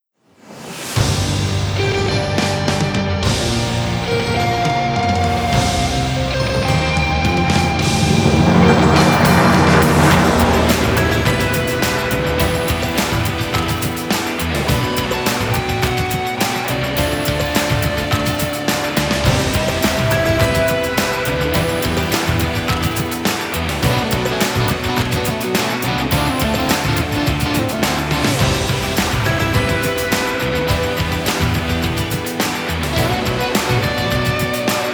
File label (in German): Messe Trailer; corporate sound; Zeppelin